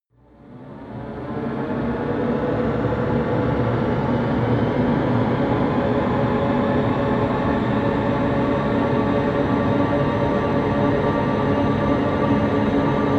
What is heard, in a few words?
drone constantly rising